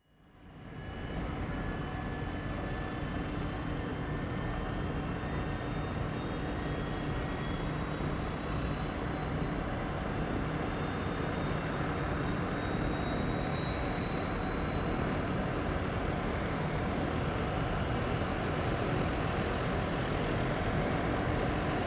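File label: Troop Activity Military, bgs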